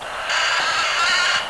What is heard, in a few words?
magpie squak